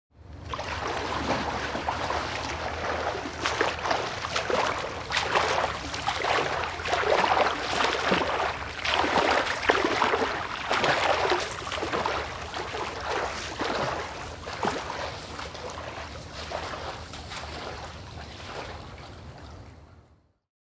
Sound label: Man swims